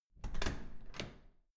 door open

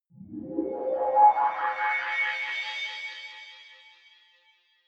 energy generator start settle

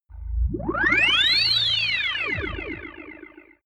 buzzing beam; energie

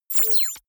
confirm high tech beep, signale; soundpool

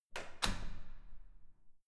security door close soft

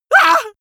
male painful shout short; comedy-cartoon; soundpool

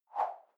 whoosh simple short tonal high

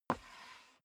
step boots wood creak slide long; schritte

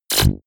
sci-fi plasma cannon fire deep space

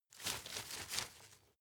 grab shake slow medium; berufe; foerster

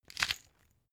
glass pepper grind single; berufe; gastronomie; soundpool

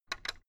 fence gate latch rattle short; objekte; tueren

